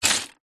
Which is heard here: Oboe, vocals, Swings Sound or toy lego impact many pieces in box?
toy lego impact many pieces in box